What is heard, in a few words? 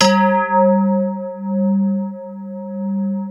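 glass tubes; Sound Gallery - Charged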